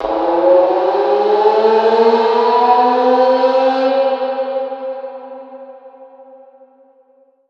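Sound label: Reverb Processed, alarm siren samples 125bpm; musicradar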